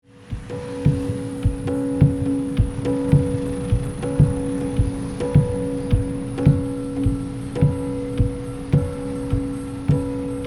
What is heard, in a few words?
field recording mix